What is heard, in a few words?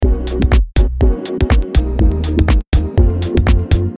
Drum Loop Without Compression